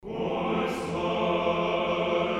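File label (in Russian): коль славен наш господь в сионе, мужской хор православные певчие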